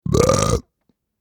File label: belch